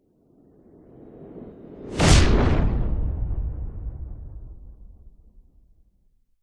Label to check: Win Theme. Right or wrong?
Wrong